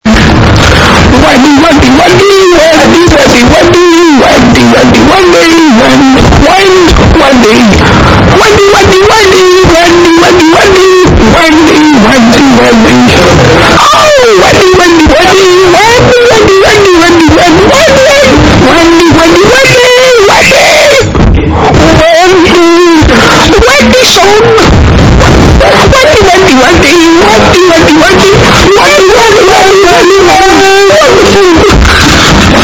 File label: song bass boosted